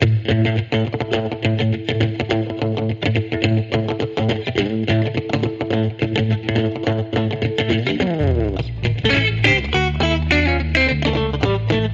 Electric Redneck, No Drums

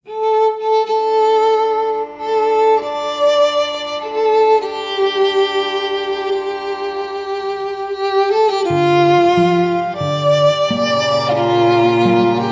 Strings Section

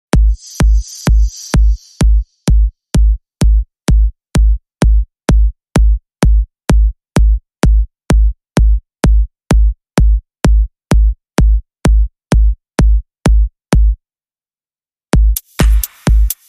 Beat

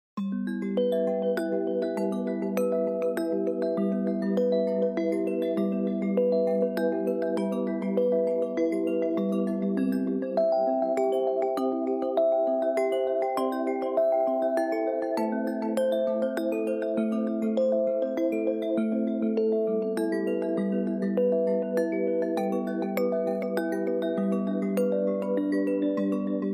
Arp

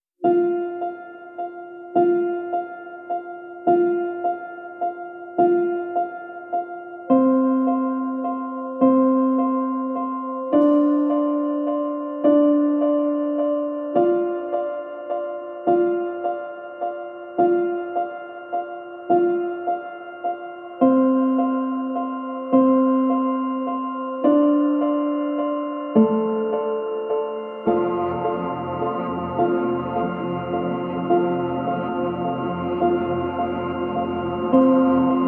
Ambient Mix

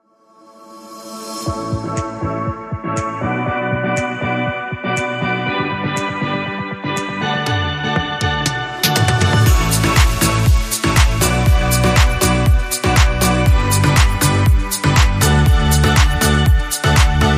Happy Crowd, Rhythm

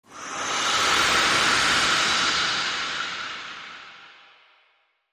Transition Sweep

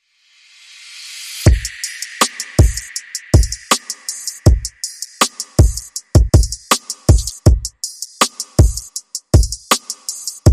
Going Low Beat

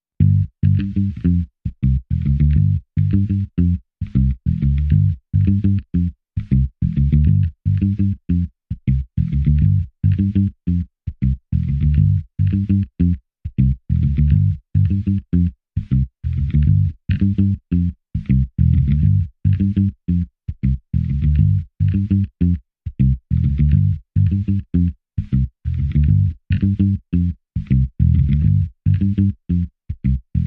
Funk, Bass Only

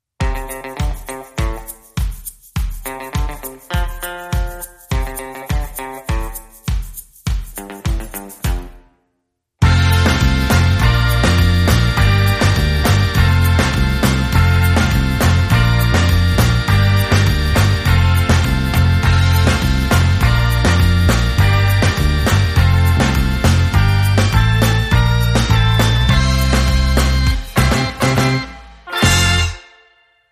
Underscore

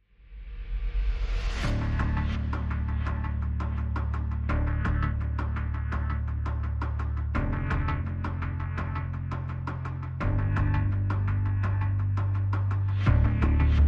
Pads and Pulse

